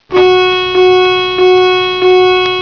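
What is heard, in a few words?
gen alarm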